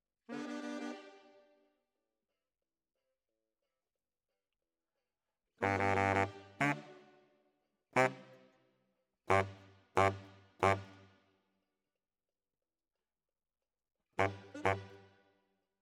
Bari, RNR for piano

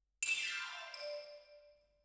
Twin R Xylo

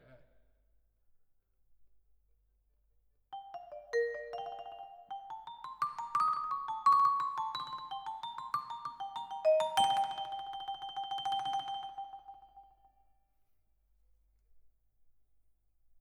Vibra, Twin F

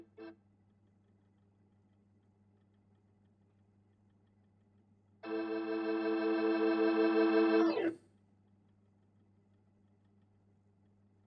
Hammond

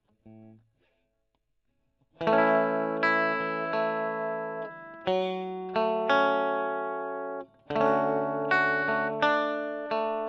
E. GTR Gefell

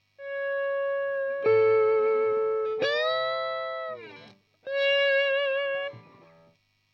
E.GTR AMP SOLO

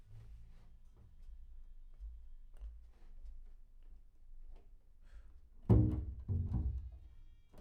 Bass Room

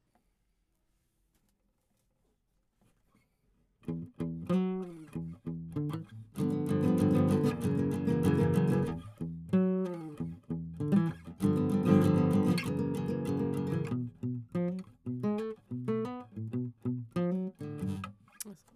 GTR

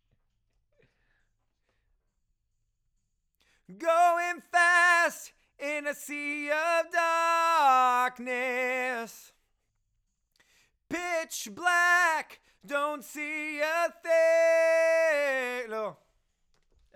LV Choru

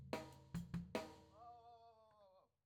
Floor

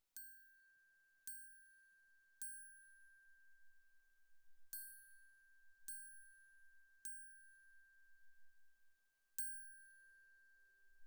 Glock Close

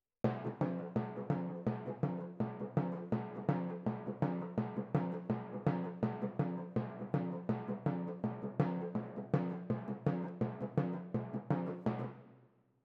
Timp Close

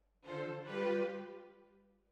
Hall

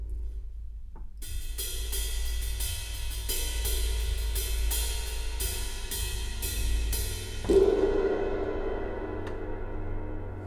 Room A

